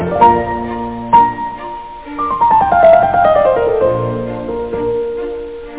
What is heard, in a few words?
PIANO